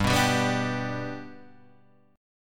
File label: G Major down; G strummed; JGuitar